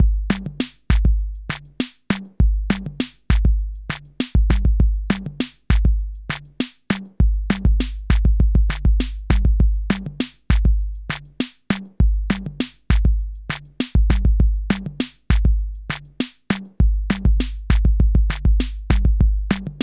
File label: RAGGAE; INIS; Funky Xtreams